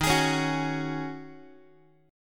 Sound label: Eb Minor 11th Eb down Guitar 4A,4E,5A,5D,5G sharp,6C sharp; Ebm11 strummed; JGuitar